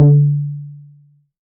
subtle reverb tome drum sample d key

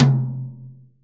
big room tom drum single hit c key

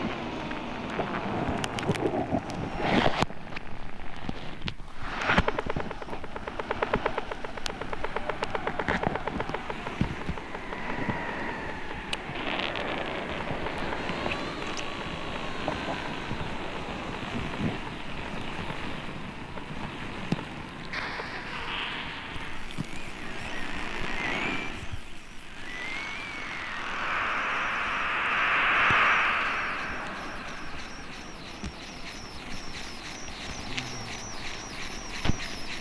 hydrophone recording of burning embers underwater; soundscapes

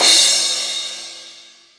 long room reverb crash sound clip a sharp key

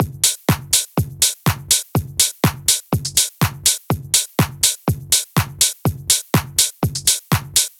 tech house fills hats and dimmed kicks 123; drum fills

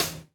hot hat sound sample g sharp key, closed hi hat

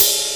studio ride cymbal a key; cymbal drum samples

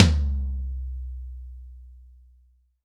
long tom sample d key

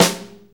sizzle snare sample g key; snare drum samples

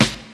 old school prominent snare g sharp key

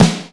natural snare drum sound f key; snare drum samples

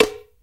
clap single hit g sharp key; single hits one shots

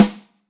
00s snare g sharp key; snare drum samples; one shots

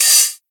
trap open high hat C key

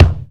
short bass drum one shot e key; tuned drums e key

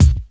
smooth kick drum sound c sharp key

